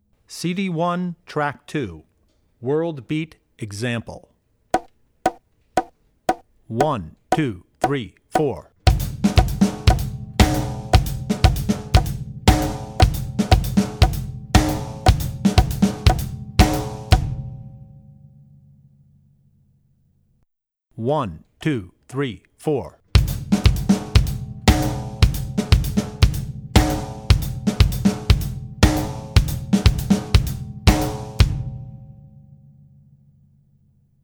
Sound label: World Beat; Drummer's Bible Tracks